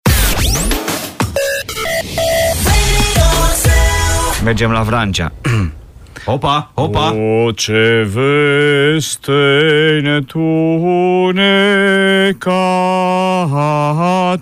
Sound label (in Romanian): Colind Vrancea; morning zu